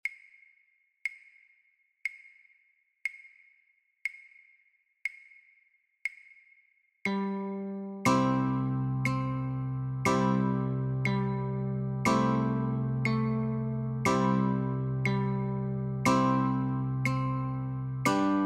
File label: Notes and Chords, Guitar